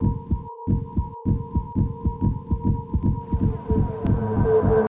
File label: Warning; Game Warning!; Tao Taido arcade